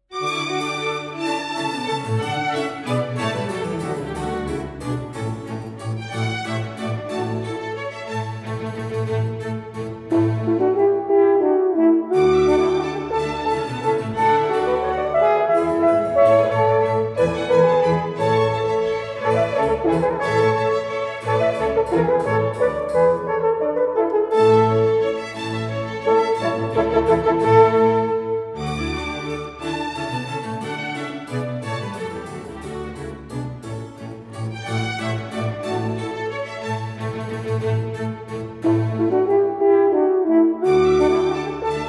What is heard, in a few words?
Concerto for Two Horns in D Major, Allegro assai